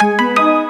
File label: Arp, Keyboards